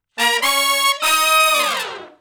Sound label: Shuffle Blues Riff (Eb) high unison falloff, Horncraft for R&B Sections